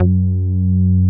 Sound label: SH 101 BASS